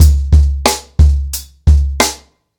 90 bpm drum beat c sharp key, kick tuned to the C# note